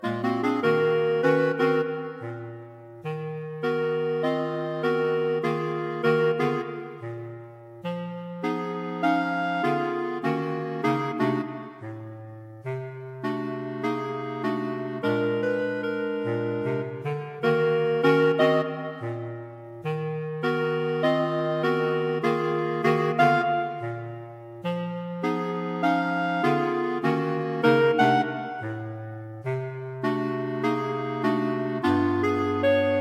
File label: Clarinet; Clarinet Quartet; Three Bb Clarinets and a Bass Clarinet